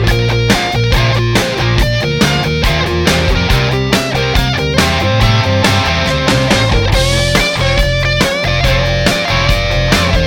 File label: KPA JCM2K; Kemper profiles